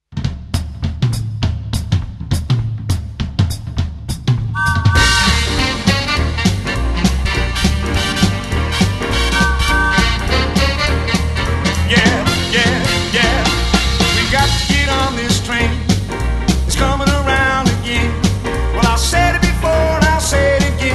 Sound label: quickstep